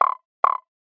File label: croak